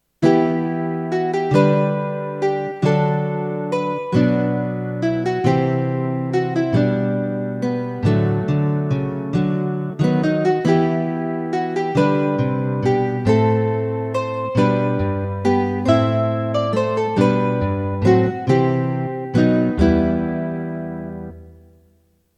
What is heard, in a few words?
Lute; BAK Music